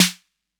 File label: 808 SNARE; Concrete Collage Drum Kit; Concrete-808